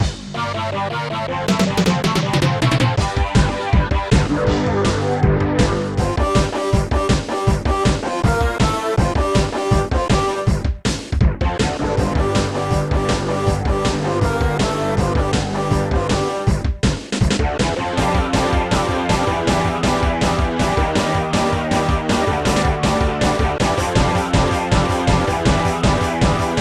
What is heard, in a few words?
menu; Sidekicks